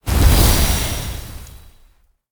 Pillar purple deth, monster

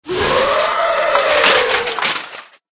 dark phalanx dead, monster

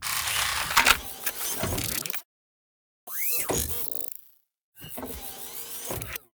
Trap Laser Turret; monster

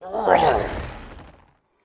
fleshgolem damage, monster

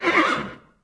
caballus damage, monster

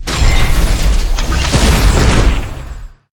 robotboss, monster

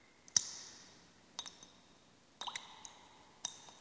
canyon, snow

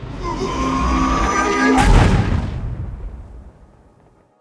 lich attack, monster

